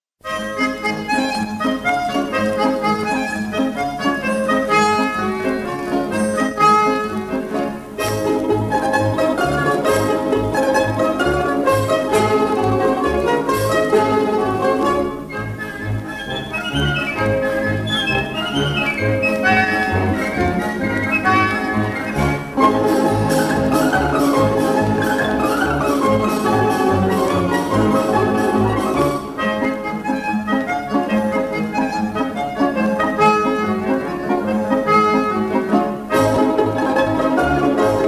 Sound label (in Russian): barinya rus nar